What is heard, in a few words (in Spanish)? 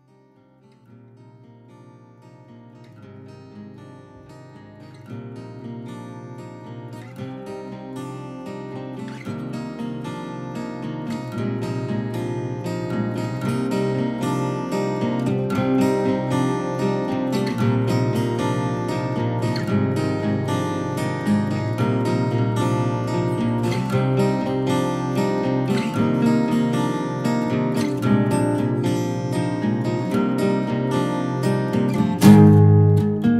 Guitarra